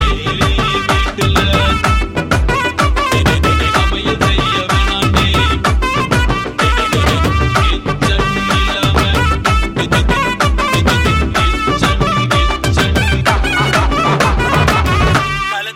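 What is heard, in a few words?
Food Song background music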